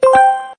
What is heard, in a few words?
correct